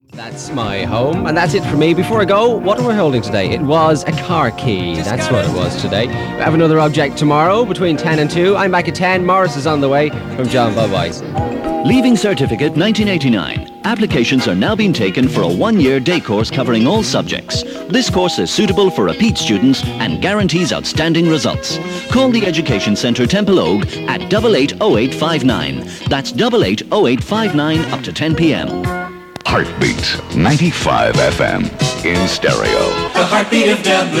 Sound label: jingle; Heartbeat